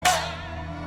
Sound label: biwa; demon slayer